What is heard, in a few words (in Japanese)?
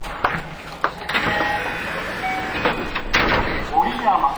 ドア開け, 225系 0番台; クリック音のようなものがするのは仕様。; ０００番台の一部を改造して登場。; 福知山線、琵琶湖線の一部において走行。